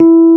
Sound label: E 0, Electric Pianos; Wizoo Electric Pianos